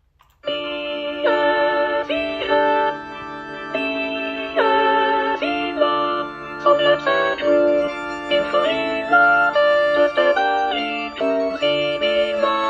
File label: soprano dies irae